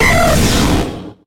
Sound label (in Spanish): Grito de Raikou